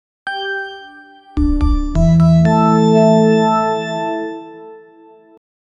emotional story advanceme, narrative

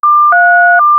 a short urgent beep sound; attention grabbing

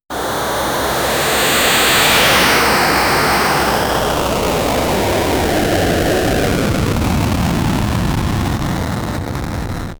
fireball sound effect, flame burst

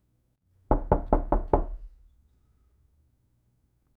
door knock on wooden door; household

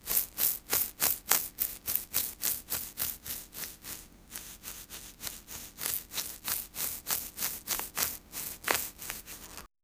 shaking

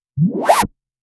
very fast smooth fabric, synthesizer

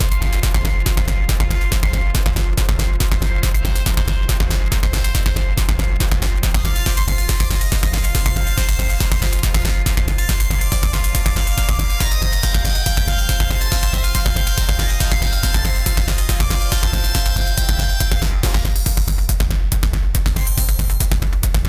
create an electronic brea, 140 bpm

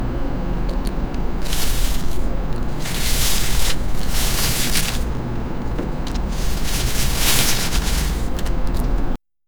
meaty movement step with, puppet

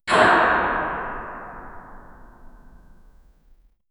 echoey corridor ambience

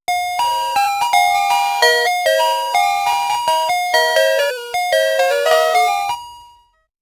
happy ice cream jingle

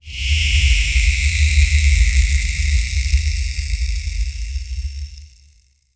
a magical beam clash sound, electric

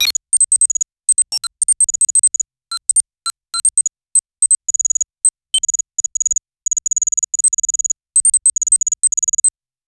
binary code hi tech, science fiction

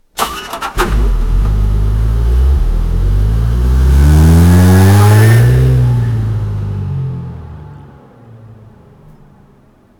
a toyota highlander; gas

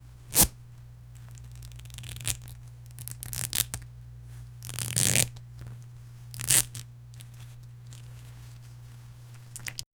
leather straps tightenin

